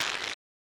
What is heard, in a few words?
stretch2 UI; Friday Night Funkin; charting Sounds